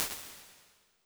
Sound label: Nice Room; Medium Spaces; Apple